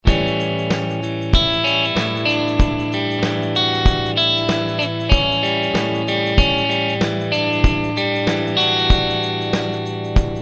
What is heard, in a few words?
autopan